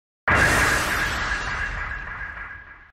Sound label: cards